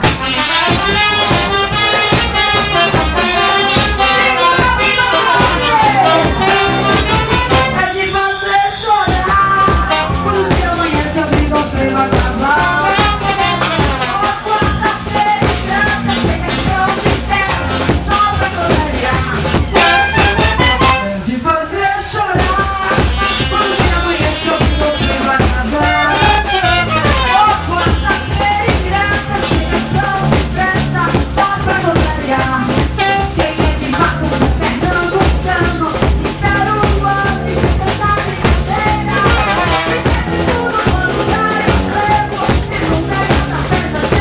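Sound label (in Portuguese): carnaval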